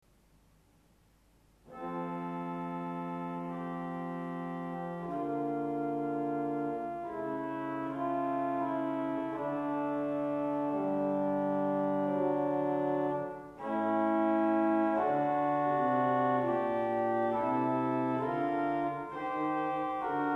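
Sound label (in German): Geigenprinzipal 8, Orgelstimmen; Orgelstimmen CD1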